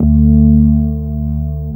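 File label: CX3 organ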